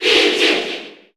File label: Cheer Japanese; Peach; SSB4; SSBU